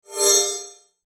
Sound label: Shimmer Swipe